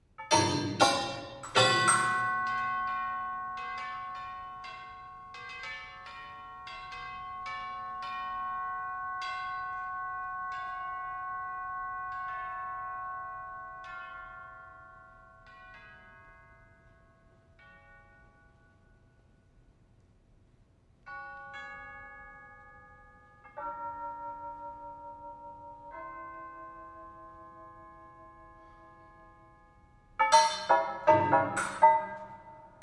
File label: extensions for solo percussion and tape; Music from SEAMUS vol. 5